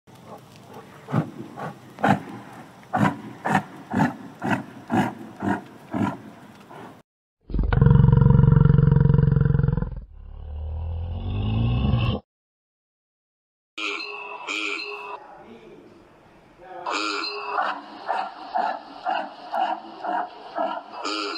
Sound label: yak; غژگاو; یاک